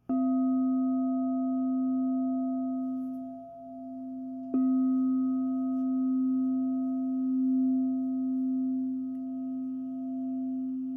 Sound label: Crystal Tones Singing Bowls